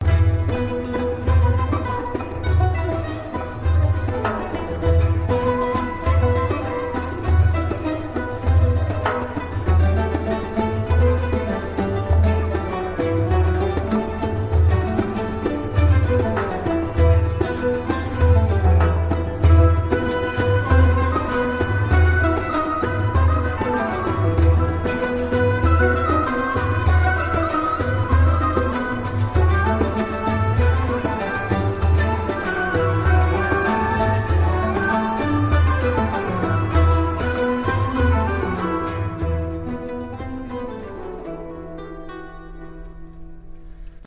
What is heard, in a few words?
Ghazal (Instrumental, Morocco)